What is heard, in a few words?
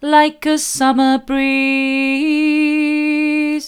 like a summer breeze mid, vocal